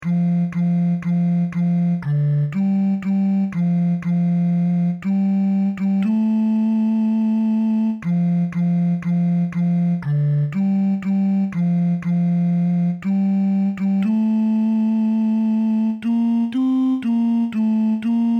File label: For the Fruits of this Creation Tenor